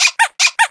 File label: taunt shake it; video games; source engine; tf